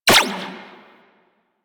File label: Shot, Bladed Pistol; Warframe; Tenno; Akjagara Prime